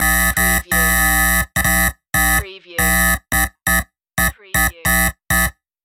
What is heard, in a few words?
Electric Buzz Pulse